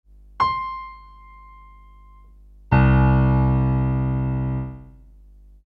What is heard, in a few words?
notes hilo